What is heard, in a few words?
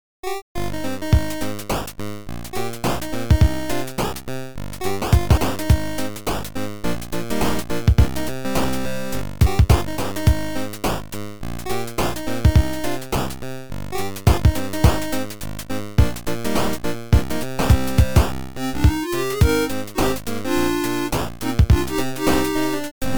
8Bit